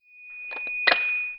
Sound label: speaker on; Apotheorasis